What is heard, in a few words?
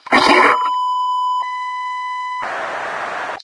die, metropolice; Garry's Mod; hl2